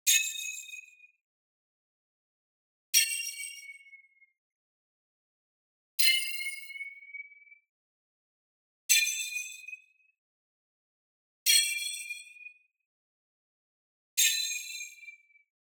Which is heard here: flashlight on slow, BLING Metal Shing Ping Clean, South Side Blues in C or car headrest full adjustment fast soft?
BLING Metal Shing Ping Clean